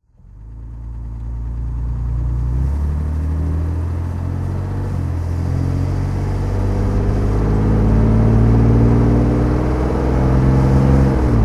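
jaguar xk150dhc automatic driving, Engine sound driving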